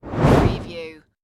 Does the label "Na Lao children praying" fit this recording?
No